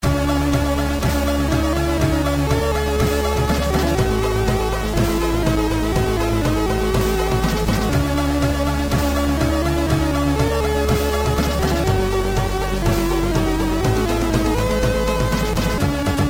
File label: C64 Double-House (Stereo)